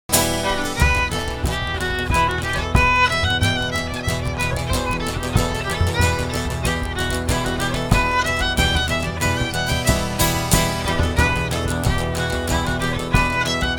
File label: HORNPIPES; The rights of Man